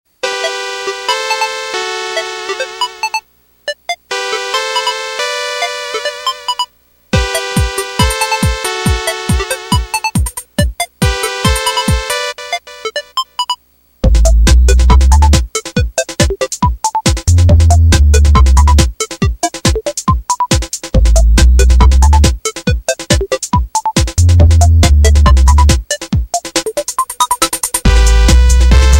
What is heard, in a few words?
Technopop